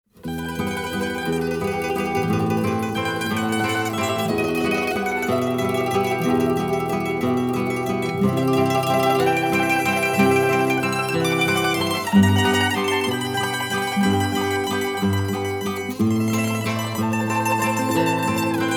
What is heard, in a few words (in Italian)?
Mandolini e Chitarre